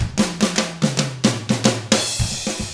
110 SAMBA, Drums; ILIO; Double Platinum Drums 1

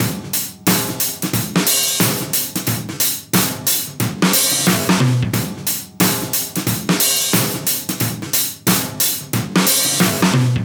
90 live funk drums

